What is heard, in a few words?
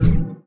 ui galaxy map close; Stellaris